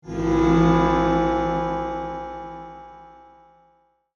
Magical Reverb Whoosh, Scifi, Magical, Reverb, Whoosh, Science, Fiction; BlastWave